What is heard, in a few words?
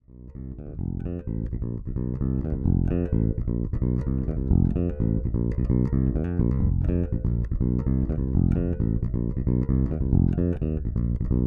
Radial JDI Fodera Monarch